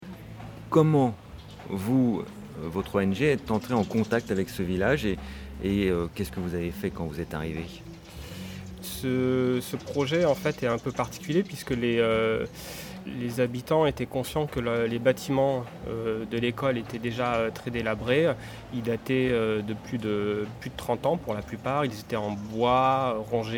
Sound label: EDM